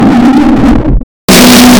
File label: stubbing toe